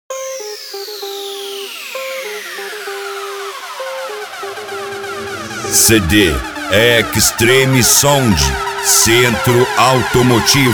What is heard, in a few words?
mega funk